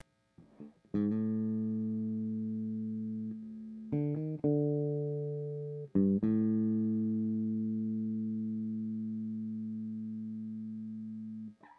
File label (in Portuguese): tema baixo